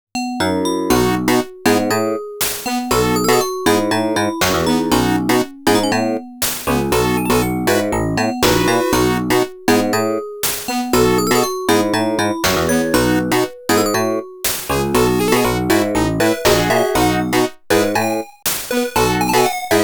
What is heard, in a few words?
MEC PC-8081mk2SR Version, 8bit; Gate 変移空間のオクテット; 8bit Original Soundtracks